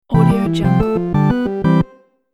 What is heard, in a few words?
circus win